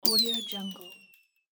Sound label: switch off